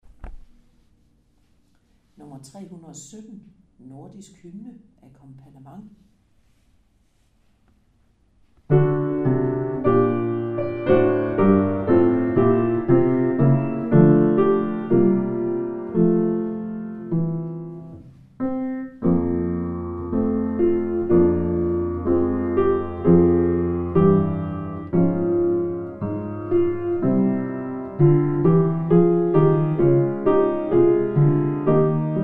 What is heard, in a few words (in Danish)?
Nordisk Hymne, Akkompagnement